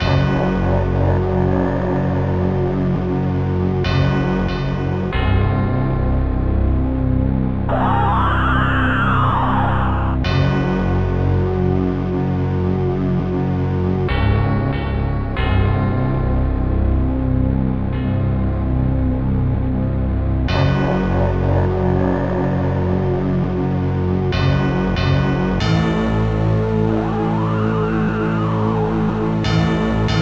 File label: Lose; Curse of Dragor